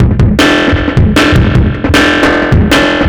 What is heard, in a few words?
Bouncing Disto Break, Drum Loops